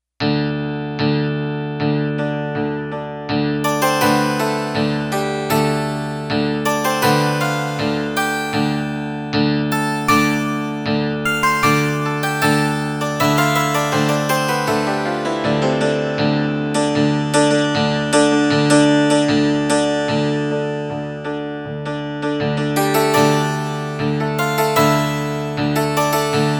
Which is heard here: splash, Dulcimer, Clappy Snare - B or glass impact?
Dulcimer